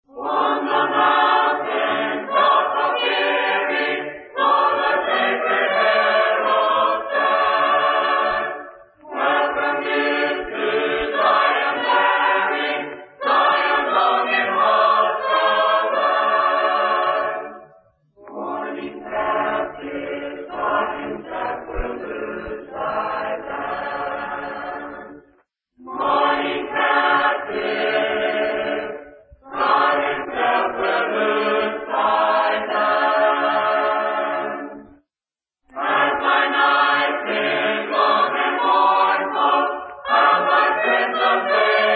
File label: a capella hymn; On The Mountain Top Appearing